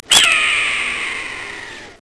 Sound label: hawk